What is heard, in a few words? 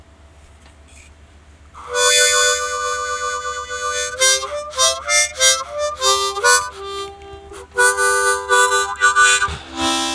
Ceramic Slide